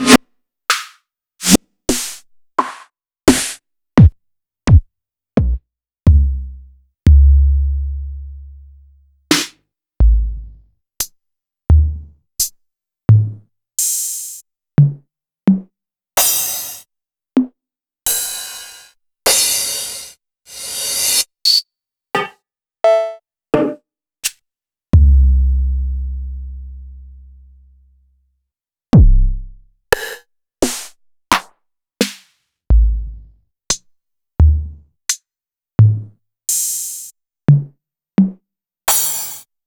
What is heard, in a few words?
Roland SH-32 Drumset